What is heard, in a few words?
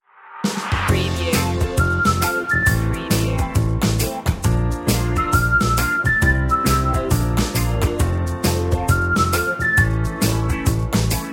carefree